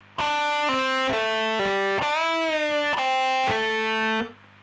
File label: riff